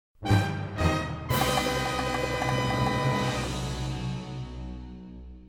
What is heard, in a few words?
quest horns